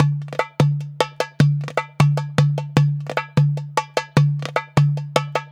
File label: DERBUKA, Balkanish Traditions